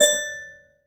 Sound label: pause back click; SPLOOSH ( + )